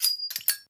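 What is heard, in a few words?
Bullet shell Shell Eject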